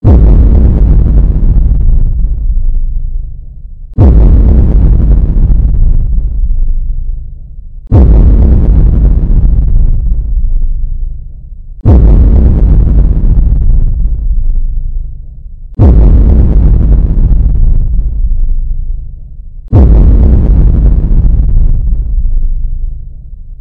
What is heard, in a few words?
Horror Beat